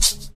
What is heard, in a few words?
Shaker; Percs